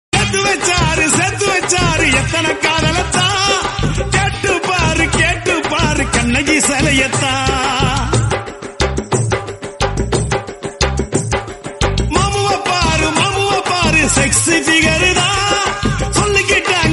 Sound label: Tamil Song Ringtone